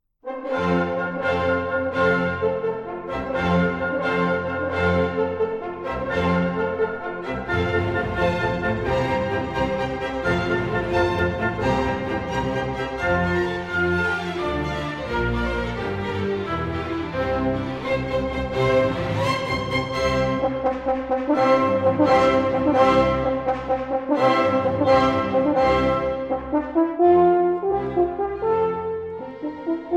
Concerto for Horn and Orchestra in F Major, Rondeau en chasse; Bohemian Horn Concertos Havlík, Stich-P